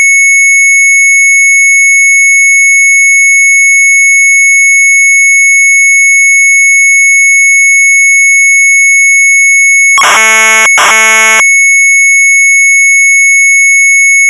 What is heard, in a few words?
Sharp MZ800 Tape; ATOOM OORLOG; retromelsarchive